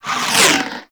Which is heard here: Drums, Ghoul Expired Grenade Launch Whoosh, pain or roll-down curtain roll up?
Ghoul Expired Grenade Launch Whoosh